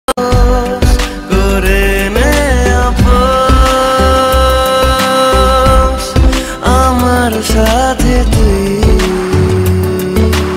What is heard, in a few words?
bengali ringtone